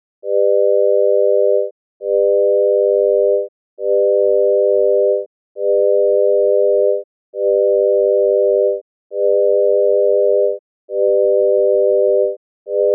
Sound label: Triad Beatings